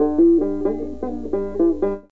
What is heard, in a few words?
banjo